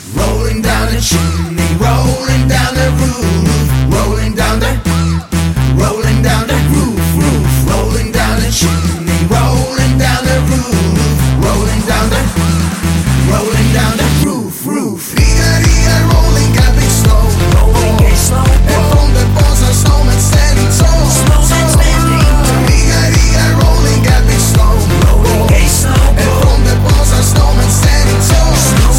Christmas song

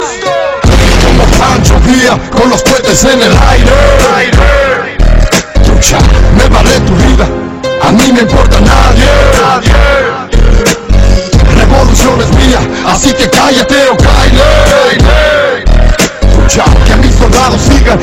Ringtone

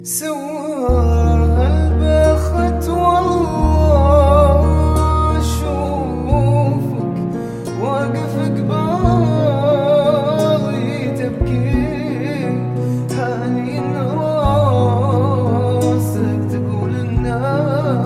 Arabic sad Song

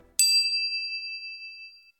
Ding; Dexter

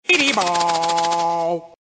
Be De Bong

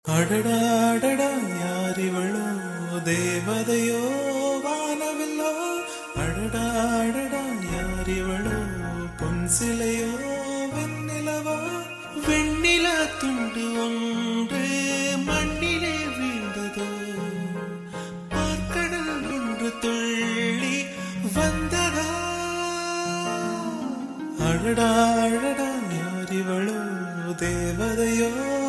Romantic Song

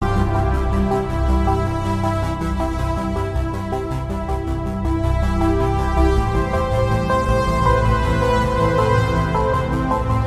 synthetic

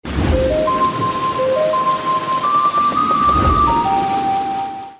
Sos Notification

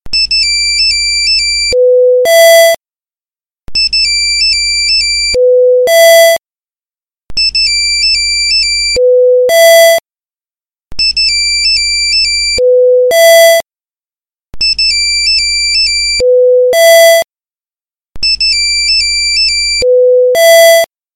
ALARM CLOCK; iP6

